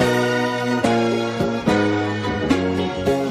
horns soul, phonky loops

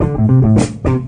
bass wooden, Loops, Phonky

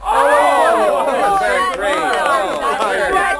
crowd cheers; ooh ahh; human

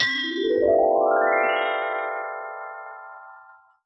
magic wand

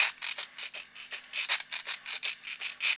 scratchy, drum loops

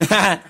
"ah ha ha", Voice

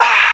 "ah" exhale; Voice; ooh ahh

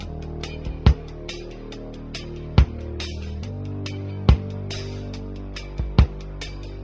keys, loops, phonky

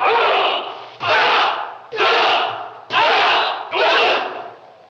hooyah hiyah haya hiya haya; ooh ahh

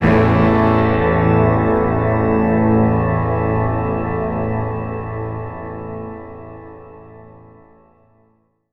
pipes, Synths; Notes

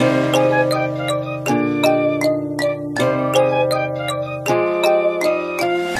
flute, Loops

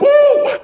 "woo"; richard pryor

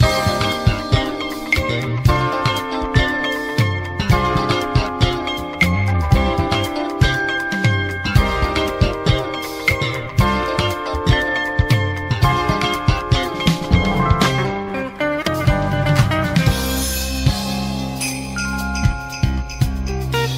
full, Loops Phonky

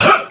huh, Voice; ooh ahh

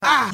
"ah", male; Voice; ooh ahh

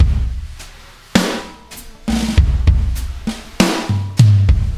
buzzy, Boombap, Drum loops

